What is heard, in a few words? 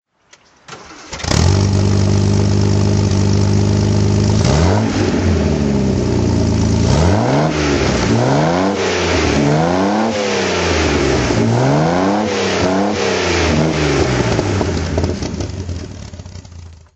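healey3000mk1 throttle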